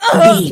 voice female hurt pain choke

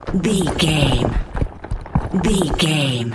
rock smashable falling debris

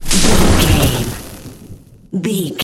elec lightning magic spell

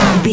retro impact hit

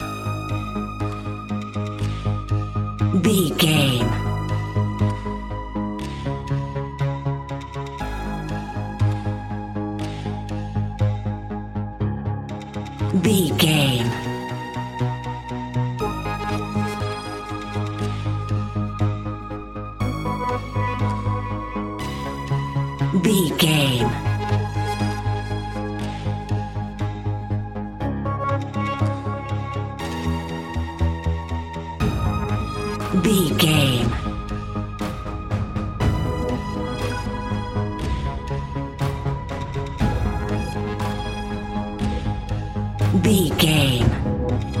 evolving tension, Evolving Suspense; Catch 22 Music Network

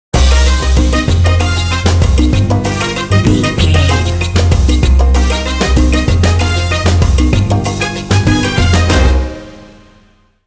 hot salsa fire sting

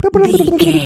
voice fun character flutter man

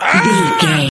voice male death

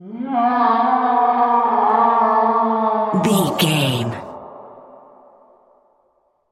voice monster roar growl groan distant

